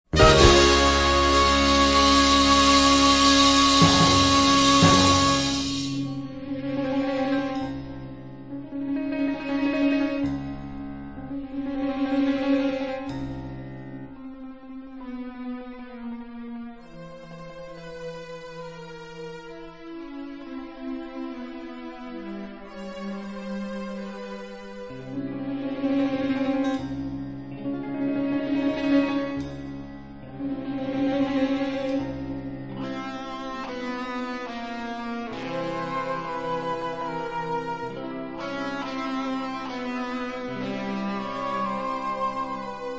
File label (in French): Musique combat